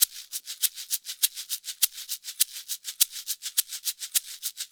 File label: Shaker 102 bpm; Loops; Drums; Percussion; Ableton Live 12 Lite; Core Library